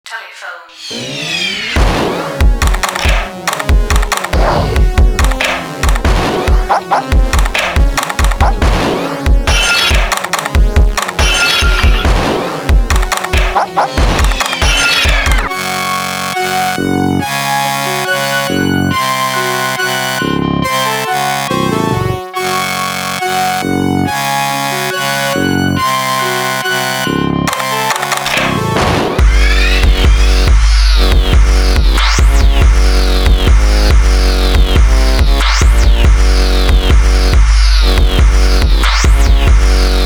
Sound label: RINGTONE